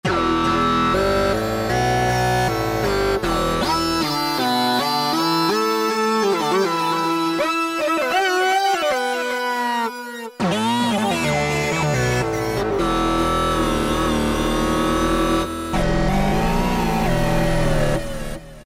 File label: Zoo Lead, Alesis QS8; Factory Preset examples